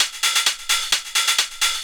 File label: hihat 2, 130, drum loops